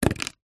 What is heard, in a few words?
foley cardboard thin strip drop set down table